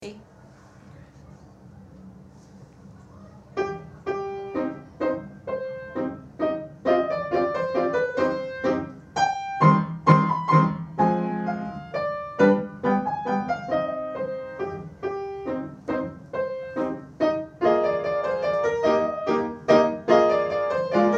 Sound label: minuet in C minor, Grade 5 set piece